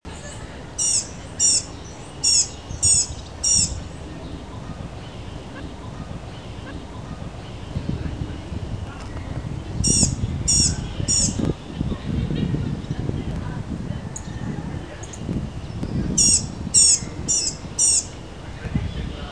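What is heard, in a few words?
blue-gray gnatcatcher; Birds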